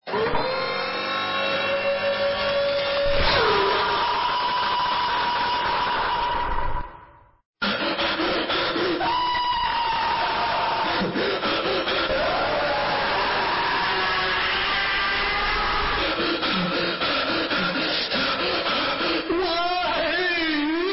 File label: scream; femur breaker